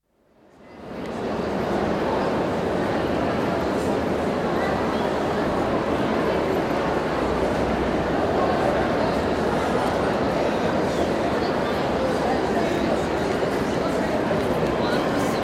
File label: Large crowd at Louvre Museum in new entrance hall under glass pyramid, with occasional close speech; Building Sound Effects; BBC